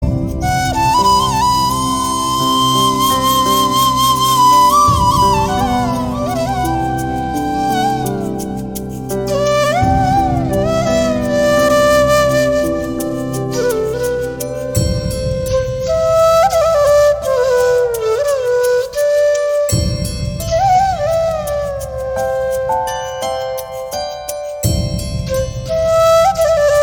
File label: Krishna Nee Begane Baro - Instrumental